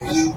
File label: whistle; tetsuon